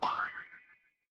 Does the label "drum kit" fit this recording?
No